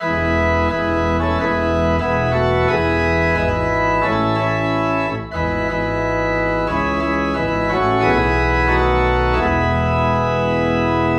Organ Low in E; Will Jesus Find Us Watching

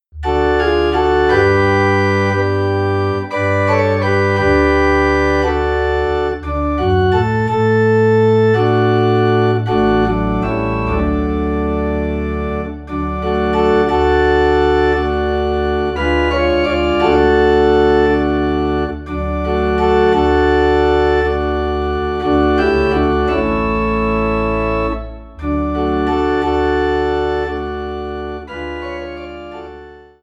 Organ in D

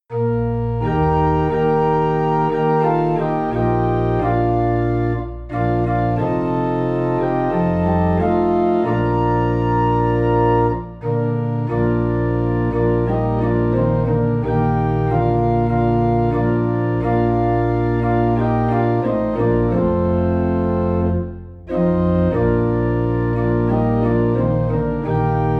Organ Low in B Flat; I Know Whom I Have Believed

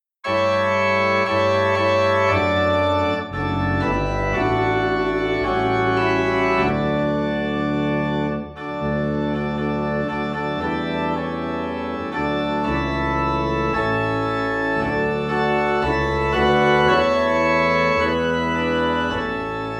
Organ in D

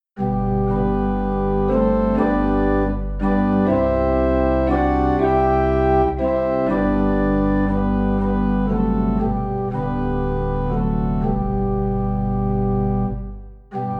Organ Low in G; Sweet Hour of Prayer

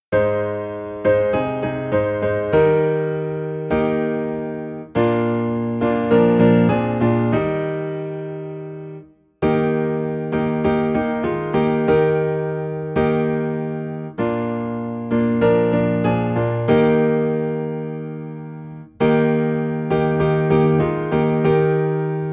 Piano in E Flat; All for Jesus

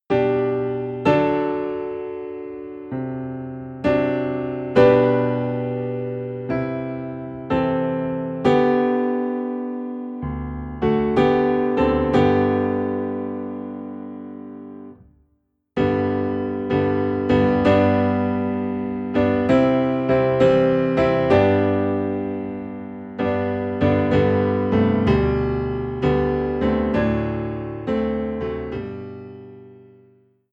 Piano Low in G; christmas; O Holy Night

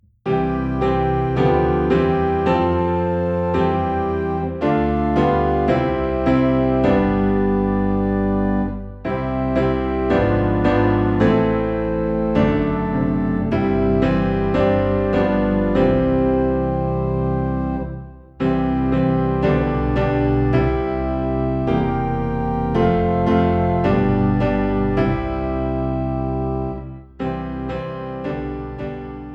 piano and organ low in C; Like a River Glorious

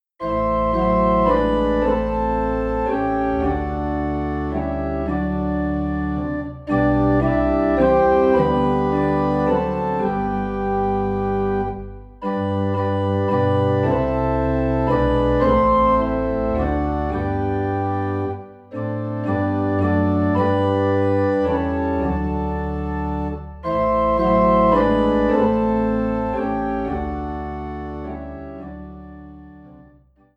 Organ in G; Jesus the Very Thought of Thee

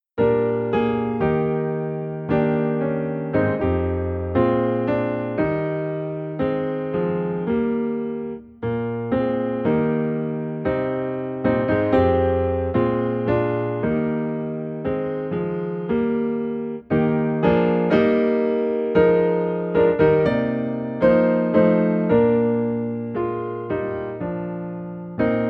Piano in E Flat

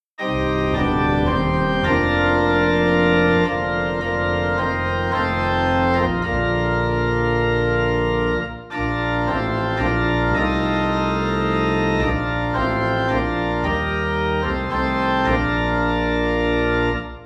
Organ Low in B Flat; Be Still My Soul

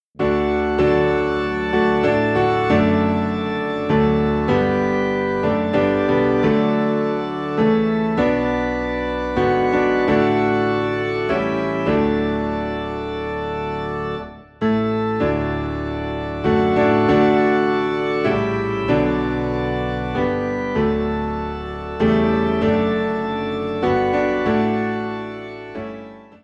Piano and Organ Low in D; Amazing Grace

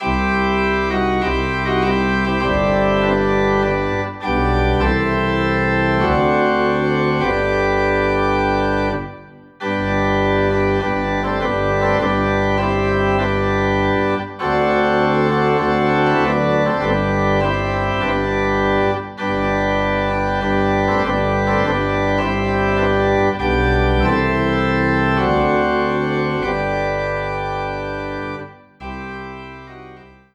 Organ Low in G

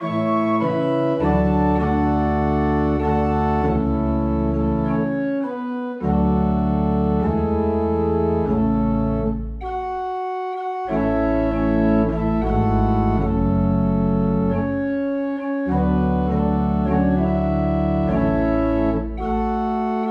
Organ in A

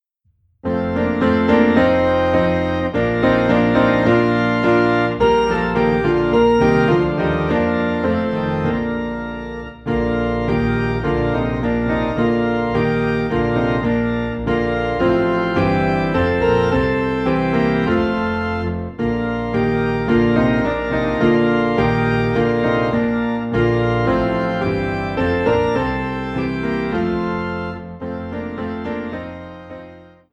Piano and Organ Low in B Flat; Lord Dismiss Us With Thy Blessing

